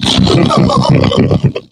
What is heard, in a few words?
zombie coming; zombie plague